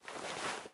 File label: weapon movement; svencoop; hl2